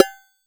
CYM DEEP; Crypton - DrumBase5000

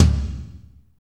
TOM, Drumscapes Roland

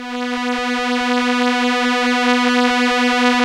STR ELKA, Elka Strings, Elka Violins; Roland LCDP09 Keys of the 60s and 70s 1

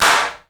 AMB P CLAP, HALL KITS; Crypton - DrumBase5000

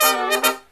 Brass Riffs; USB Soundscan vol.29; AKAI

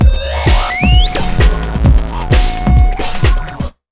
JUMP 130, Big Beat Drumloops; USB Soundscan vol.25; AKAI

